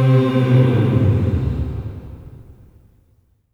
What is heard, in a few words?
OCT FALL, voices; OCT.FALLS